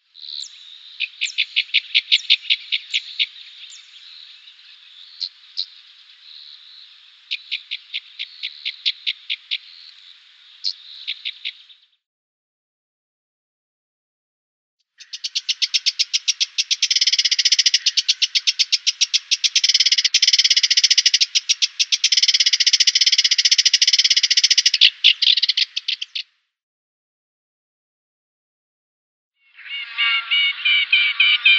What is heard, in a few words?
Cyprus Warbler calls